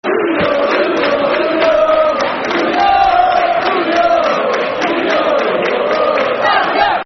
Football Chants (Soccer Songs); Middlesbrough Mfc

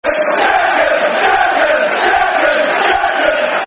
Champions, Football Chants (Soccer Song); Chelsea; fanchants-com

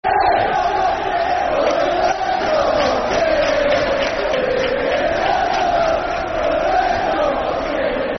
Football Chants (Soccer Songs); When The Reds Go Marching In; Barnsley Bfc

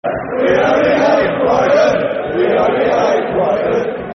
We Only Hate Brighton, Football Chants; Crystal Palace Cpfc

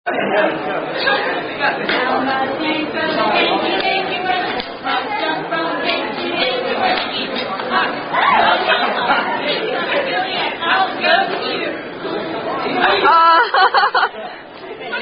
Clapping Game, Counting Out Rhyme; Bleach Cosplay; Down By The Banks

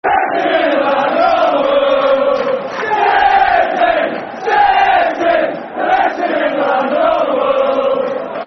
Football Chants, Man City; Man City Mcfc

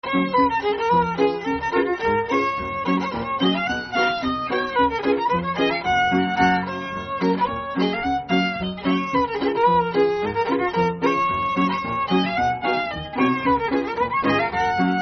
Waltz; Keller Democrat Waltz

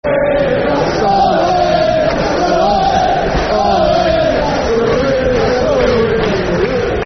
Football Chant (Soccer Songs)

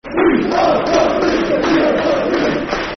We Are Top Of The League, Football Chant; Watford Wfc

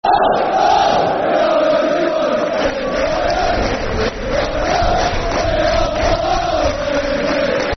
Hello, Hello, Football Chants; Sheffield Wednesday Swfc

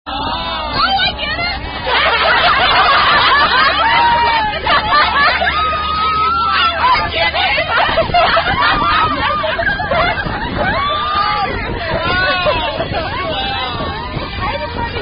rugby songs in the dark

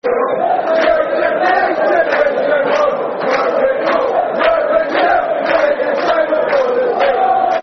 Football Chants (Soccer Songs); Wise, Wise; Derby County Dcfc

